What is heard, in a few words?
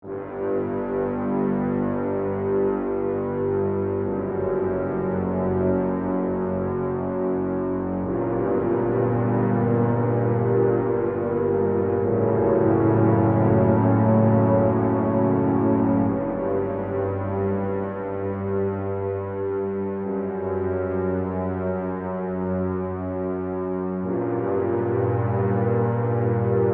Musical Orchestral, Horror, Musical, Orchestral, Swells, Ominous, Low; Scary Soundscape; BlastWave